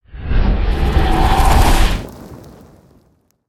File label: Fire Power Drain; Spinjitsu